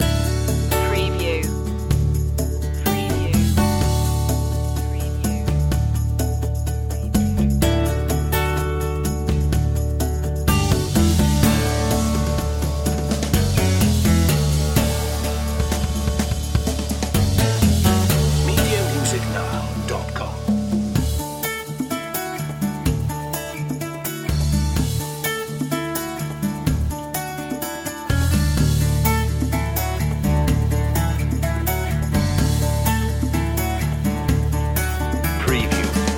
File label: home comforts loop